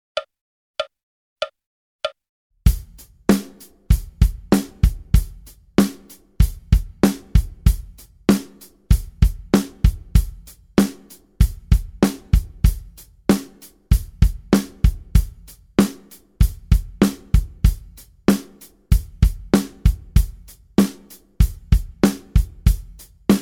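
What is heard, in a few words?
Rock-Blues even 8th 96 bpm, Guitar; even 8th 96 bmp; Guitar Aerobics Disc 2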